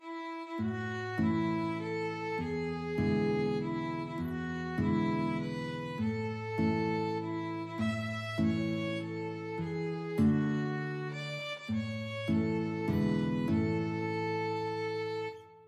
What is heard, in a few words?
happy birthday violin guitar duet